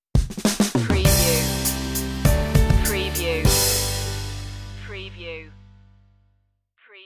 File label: family tree stinger